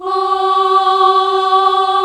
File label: FEMALE G3, ENSMBL; AKAI S-Series CD-ROM Sound Library VOL-8